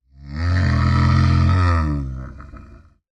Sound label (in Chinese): 骆驼站起声